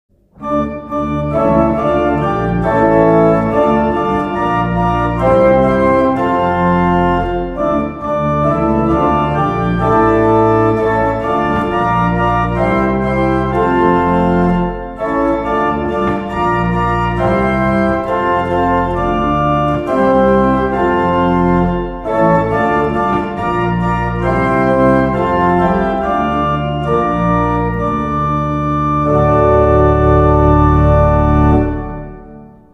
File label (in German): Orgel Ff